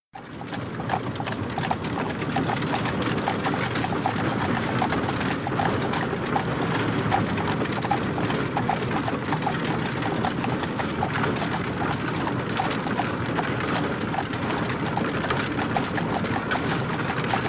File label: Horse Wagon, Medieval Fantasy Ambience